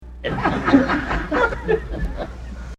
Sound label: More light laughter